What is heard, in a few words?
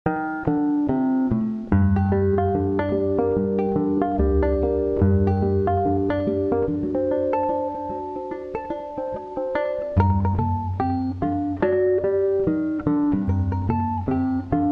Nice Noise Harmonic Damper